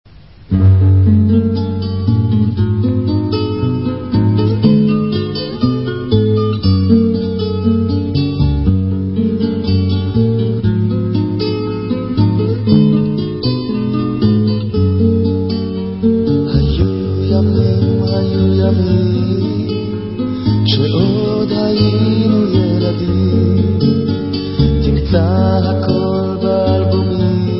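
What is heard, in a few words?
Hora Instrumental, Israeli Dances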